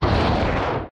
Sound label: thruster engine deactivate; platform thruster; Factorio